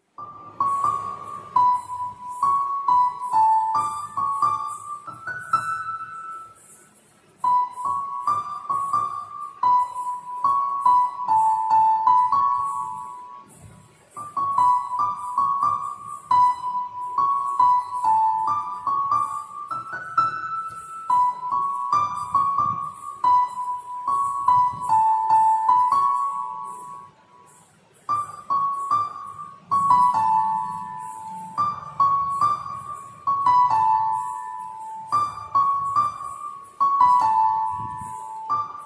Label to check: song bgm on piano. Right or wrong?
Right